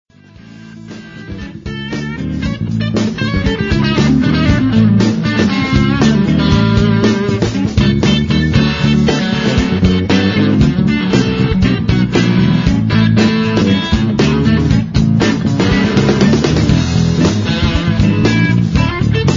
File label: blues